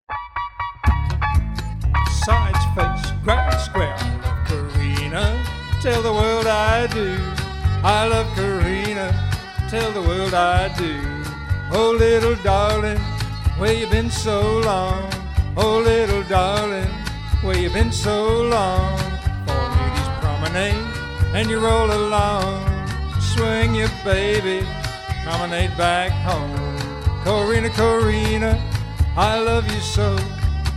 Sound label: vocal